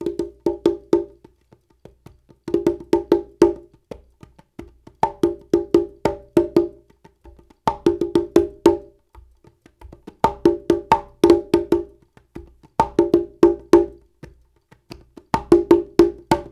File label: World Dance; Spectrasonics - Supreme Beats